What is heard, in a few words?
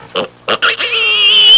Pigglet oink and squeal